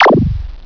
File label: Hole IN; Loony Labyrinth 1.02 Demo; Arcade